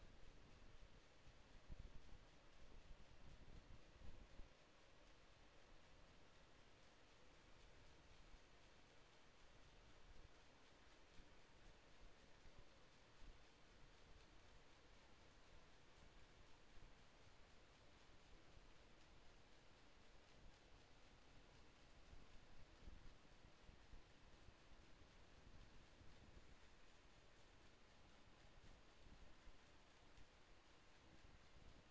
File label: HYDROPHONE 16000Hz; JASCO AMAR HYDROPHONE 740